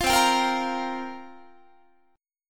C 7th Suspended 4th, Guitar, chord

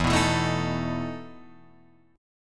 E Major 7th Suspended 4th, Guitar, Drop D

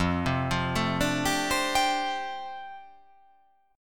F 6th Add 9th F down, chord

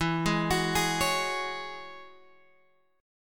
E 6th Add 9th, Guitar, Open G; chord shape

